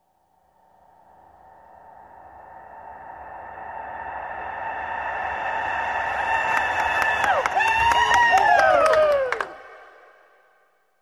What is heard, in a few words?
Arena Crowd, sports arena